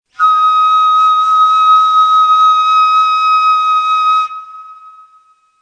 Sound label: Tiz Saba, Neysample